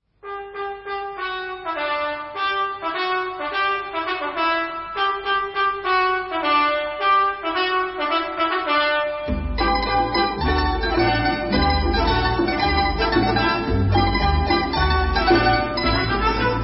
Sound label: Debka Singers